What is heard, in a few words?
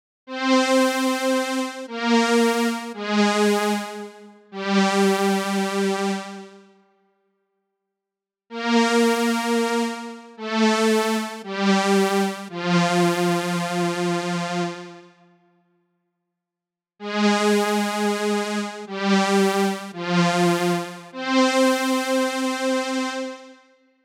Brass; Blade Runner